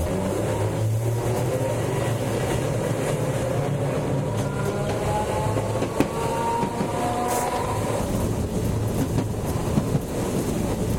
streetcar go slow; tetsuon

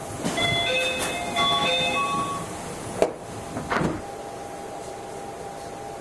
monokoza door; tetsuon

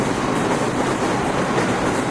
701 igbt mid bridge; tetsuon

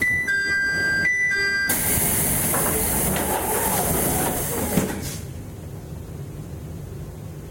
chime door; tetsuon

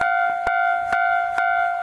crossing; tetsuon

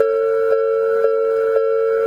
crossing; tetsuon

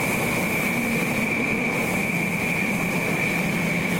E231 sub slow curve; tetsuon

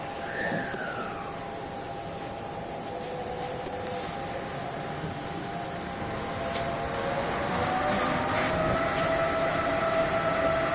monokoza go middle; tetsuon

